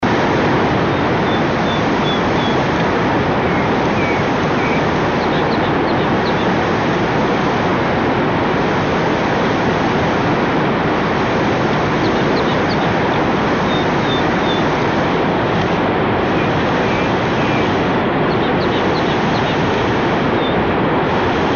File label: Mirlo de Agua, Cinclus schulzi, Rufous-throated Dipper; PASSERIFORMES; Cinclidae; cantos